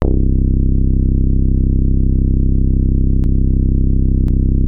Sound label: Access Virus B; Synthworx